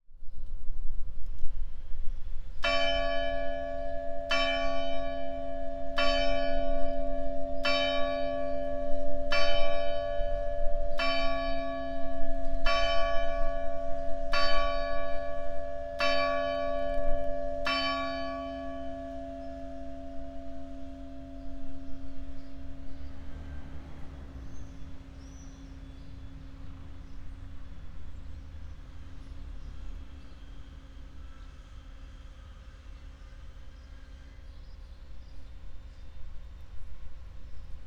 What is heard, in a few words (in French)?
Eglise 10h; Blauzac